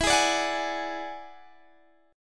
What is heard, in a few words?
E 9th E down Guitar Open D